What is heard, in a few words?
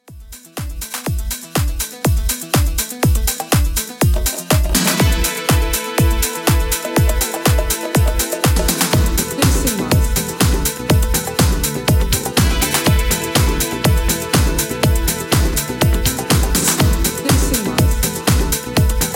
80s Classic Extended 122Bpm Clean